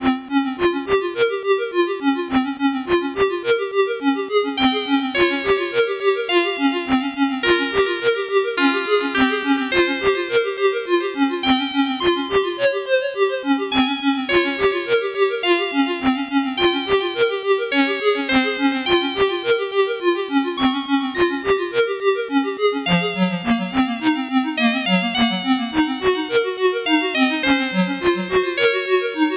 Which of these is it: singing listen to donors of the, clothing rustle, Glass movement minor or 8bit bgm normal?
8bit bgm normal